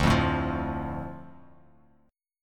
D Minor D, chord; Dm strummed; 10,8,7,10; JGuitar

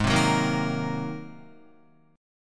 G sharp 9th G sharp Guitar; Listen to G#9 strummed

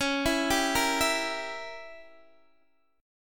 Db Diminished 7th Db down Guitar Open D

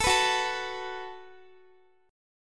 F sharp Minor Double Flat 5th, Guitar; F#mbb5 strummed; x,x,19,16,18,16; 4E,4A,5D,5G,5C,6F; chord shape

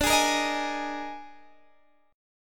D sharp Major 7th Suspended 4th, Guitar

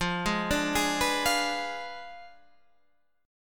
F Diminished 7th F down Guitar Standard

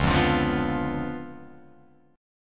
Db 7th Flat 9th, Guitar, Open F; Db7b9 strummed; JGuitar

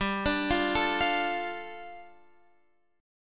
G 7th Suspended 2nd G Guitar Half Step Down

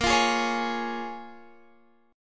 Bb Minor 7th Bb; Bbm7 strummed

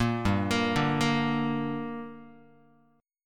G Minor G down Guitar, chord

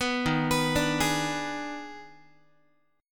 D sharp Major 7th Suspended 4th Sharp 5th, Guitar; arpeggiated; D#M7sus4#5; JGuitar

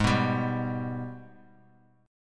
Minor Major 7th; G#mM7 strummed; JGuitar

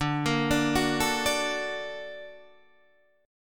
A sharp Augmented Major 7th

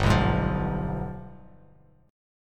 G 7th Flat 9th chord, Bass Standard; strummed; JGuitar

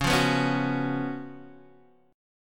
Db 11th Db, down, Guitar Standard; strummed; JGuitar

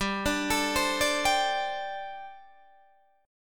G Suspended 2nd Suspended 4th, Guitar Open D

